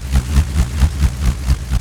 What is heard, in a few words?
BULLROARER, Primitive Instruments